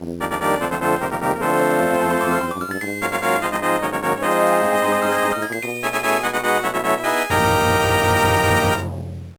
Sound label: Great Victory!; legend of zelda the a link to the past gb